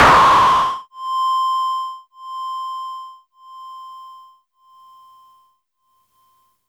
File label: FUNK, FX; USB Soundscan vol.47; AKAI